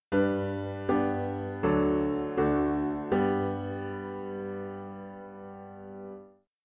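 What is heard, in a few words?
piano, dictation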